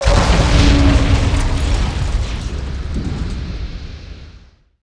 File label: CRASH